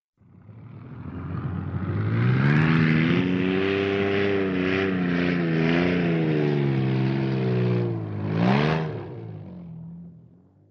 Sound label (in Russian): zvuk proezda po bezdorozhju